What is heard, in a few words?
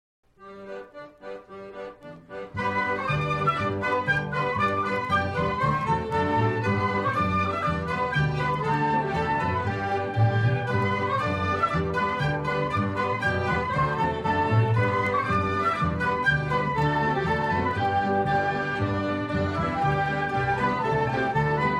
rant, instrumental